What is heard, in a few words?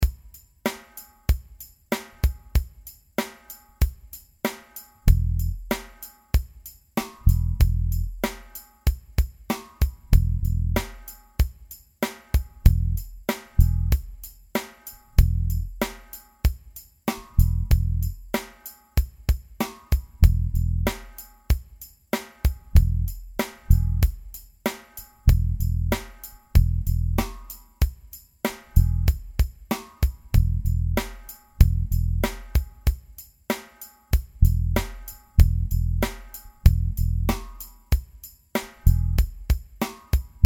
rhythm